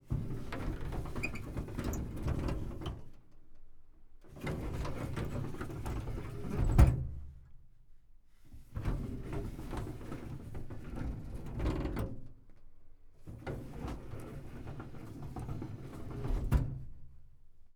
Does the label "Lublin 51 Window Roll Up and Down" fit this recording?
Yes